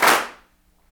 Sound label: CLAPS, Africa Handclaps